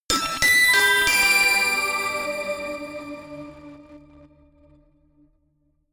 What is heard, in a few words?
notification glockenspiel crazy sequence jump; instrumente